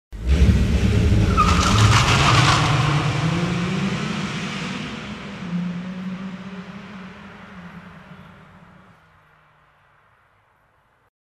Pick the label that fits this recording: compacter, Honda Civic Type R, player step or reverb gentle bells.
Honda Civic Type R